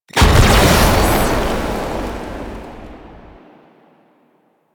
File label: Absorb End; Stalker Assassin; Warframe; Warframes